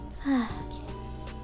sigh

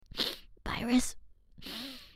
snort; noises; byerys

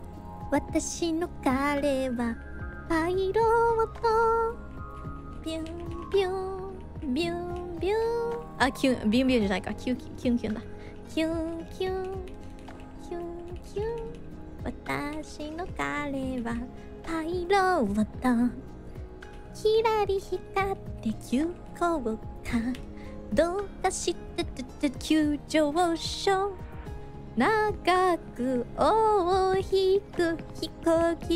Singing; IRyS

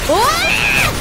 Scream; died by a gundam again; IRyScream

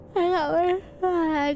Yawn

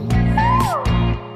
Wooh

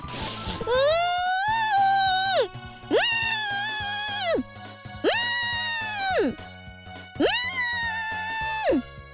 HMMMMMMMMMMMMMMMMMMMMMMM

